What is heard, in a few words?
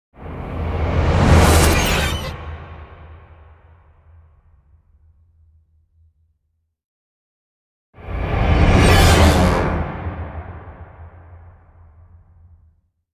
Orchestral Reversed Screeches; Epic Tools vol. 1